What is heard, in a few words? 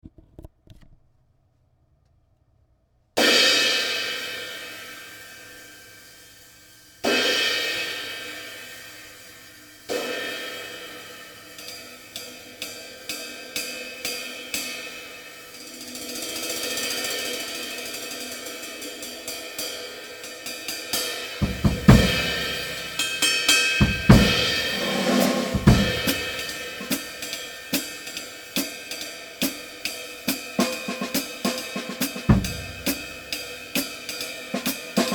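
21 Zildjian SDLR Proto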